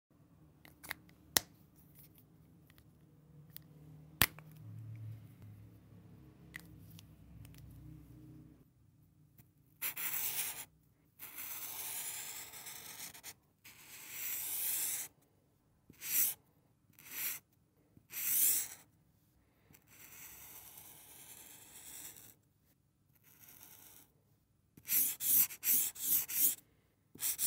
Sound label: highlighter